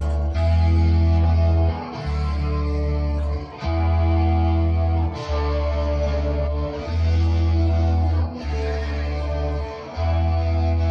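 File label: Solo D major 159bpm 440 Hz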